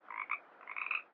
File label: frog; animal